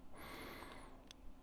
breath

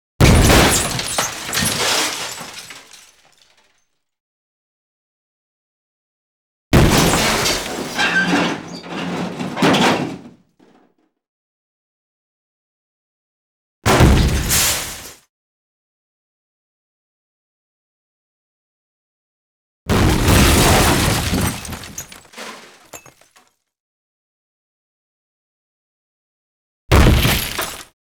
impacts med; Asian Show Sound Effects

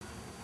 smoke

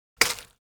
impact juicy; fights bodyfalls; Asian Show Sound Effects

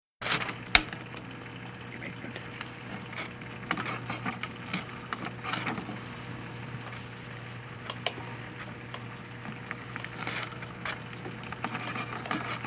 polygraph

